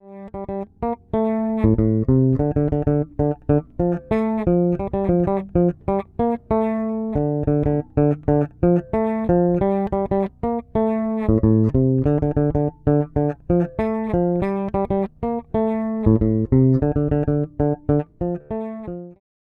Fodera Monarch Standard Special Bridge Pickup

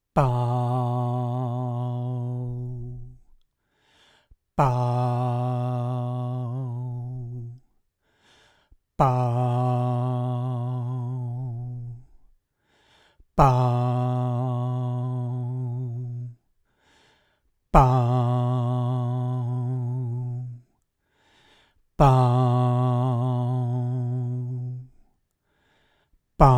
Hornet Color

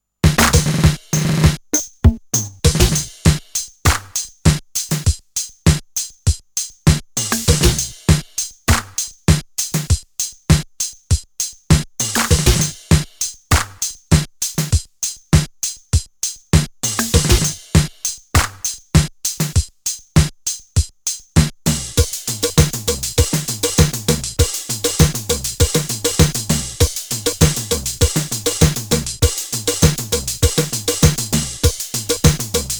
Wersi CX2 dry Reggae

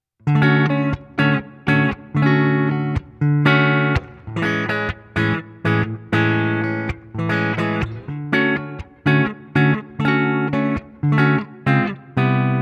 Cort X700 Neck Humbucker Clean

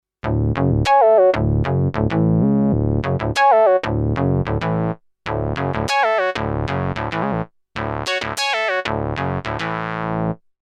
MD900 Bandpass Arp

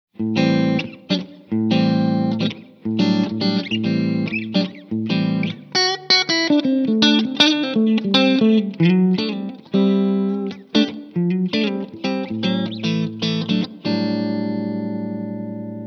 Blackstar AMPED1 clean USA 6V6

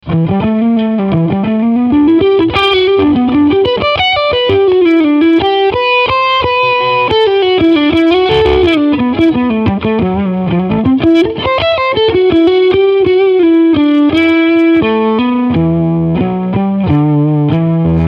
Full Gain

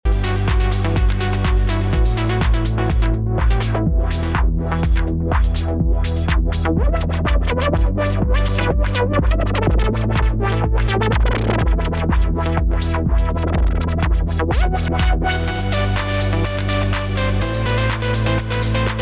Pioneer DJM A9 Triplet Filter

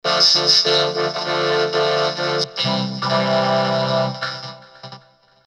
vocoder

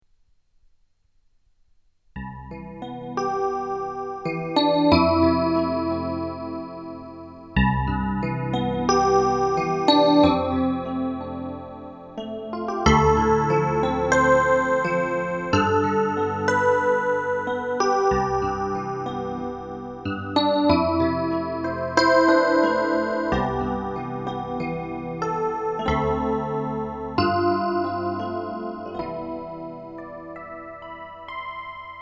DP7U EPiano

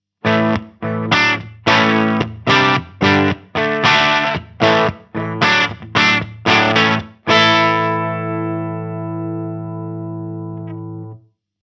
Chug Off; Solar Guitars

